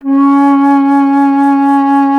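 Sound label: flute, alto flute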